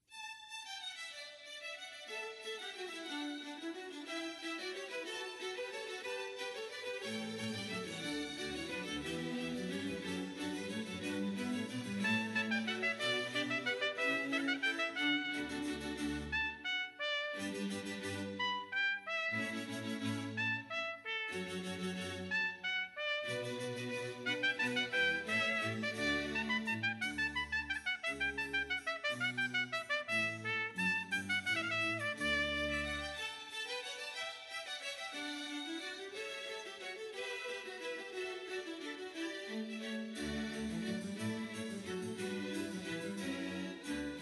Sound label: sonata for trumpe; purcell